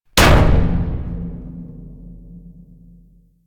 Jump Slam Impact; Warframe; Vehicles; NechroMech; Abilities

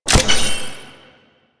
Owl Recon Aim Connect Start; Duviri

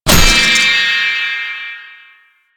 Orb Shot Start; Duviri Medusa Puzzle

